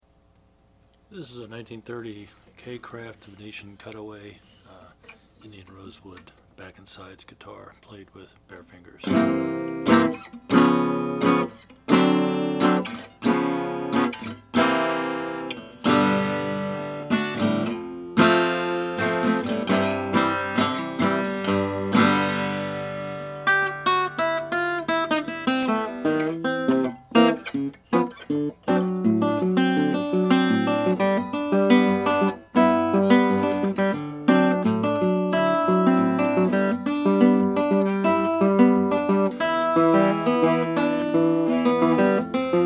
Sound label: kay kraft style 3 natural kay kraft style